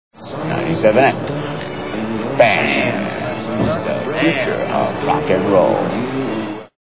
rock, rain

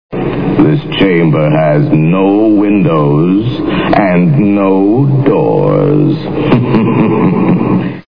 haunted

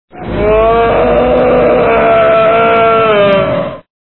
groan

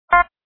beep

